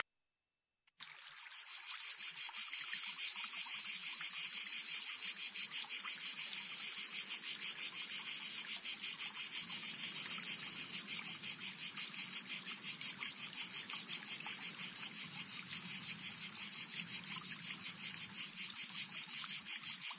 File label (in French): Son cigale